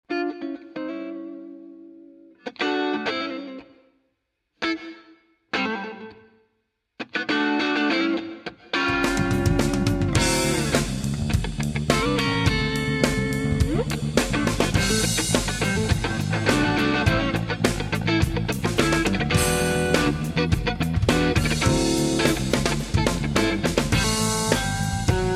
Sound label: Sample Stratocaster